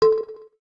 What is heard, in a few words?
electric, keytone